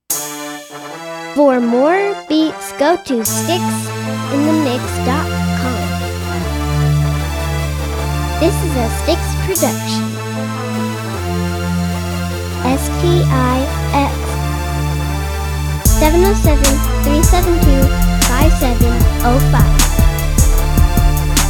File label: crunk